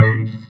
VOX VOX, Electro Vox

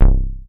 PENTAN C2, BASS; Q Up Arts; Dream Experience

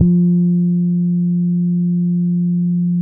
F 4, hood boom, bass; Spectrasonics; Bass Legends CD 1

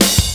Fill, Drum N Bass Percussion Fills n Breaks

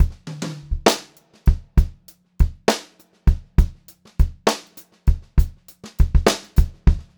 Pop-Rock Drumloops, 100

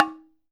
OKAWA, JAPAN PERC; AKAI S6000 CD-ROM; WorldSounds

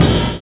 60 S BEAT; Univers Sons - Kitch 70s

